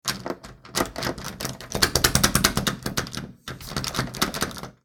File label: Door Knob